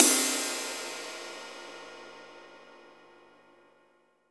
RIDE, Ride Cymbal; SAB18; MONO RIDE CYMBAL 1; AKAI S6000 CD-ROM - Volume 3

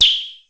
REZ NOIZ, TEC INDU PER; Crypton - DrumBase5000

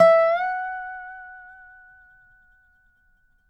dobro, F#5; DOBRO UP

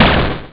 shot; Amiga Soundtracker Sample Packs

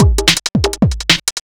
Speed loop, Drums Beats

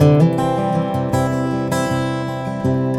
A GUIT C MAJ, 80BPM; Best Service; World Colours

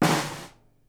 SNARE, Live Snares

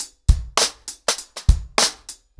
100BPM, ACOUSTIC DRUMS; The Roots Drumloops

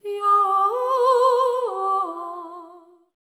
LAMENT

